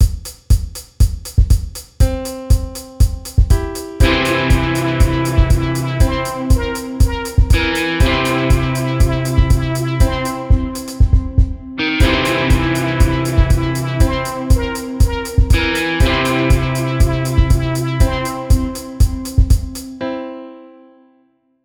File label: drum piece; Year 9 Music